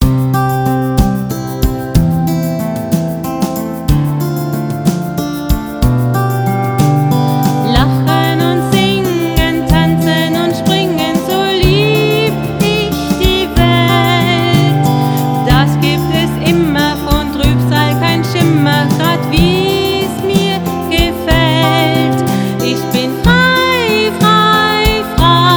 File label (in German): Lachen und Singen